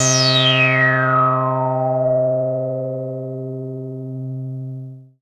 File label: SYNTH